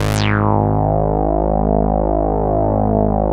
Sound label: saw res dry, synth; Keith Emerson Signature Series